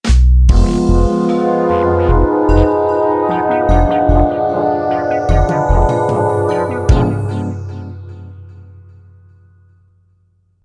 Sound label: Jingle Loose; equilibrio; wiiware